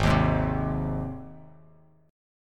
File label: G sharp Major G sharp Bass Standard, chord; G# strummed; 4,6,6,5; JGuitar